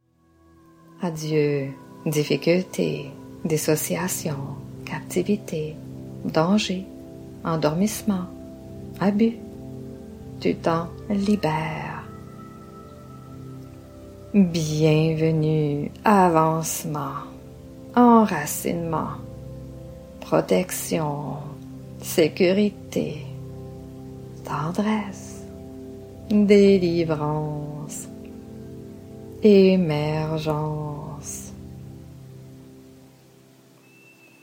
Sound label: Harmonisation